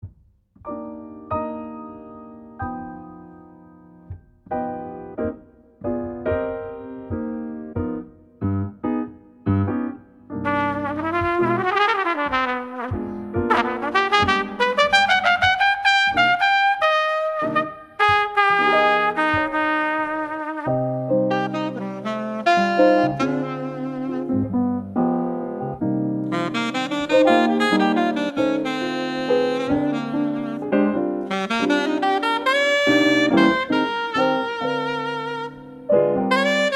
Dzhazovoe trio

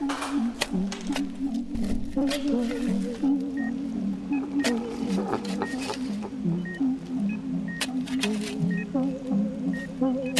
Golosa

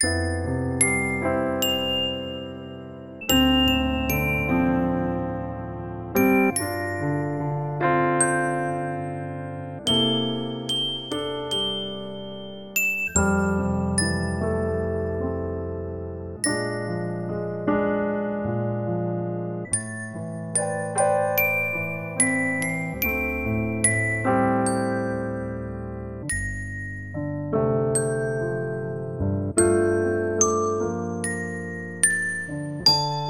Koshachya muzyka, Zvuki Spyacshie koshki; Radio

